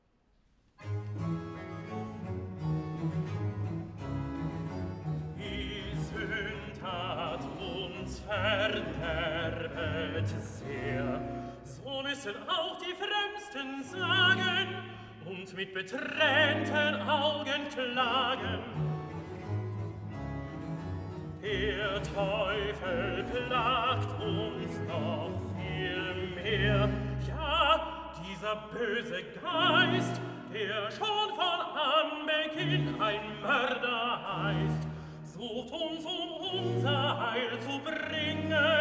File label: Recitativo e Choral Tenor; BWV101; Die Sünd hat uns verderbet sehr; Nimm von uns Herr, du treuer Gott; BACH CANTATAS